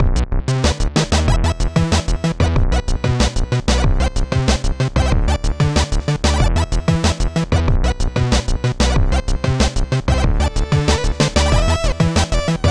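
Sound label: keygen song.mod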